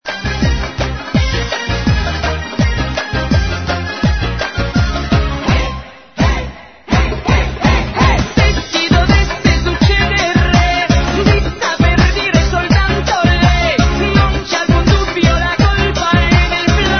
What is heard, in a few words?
flamenco, fr